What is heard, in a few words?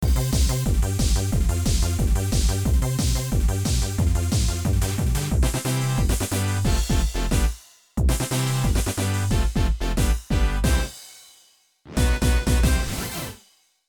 Remix Tempo Up; Rhythm Tengoku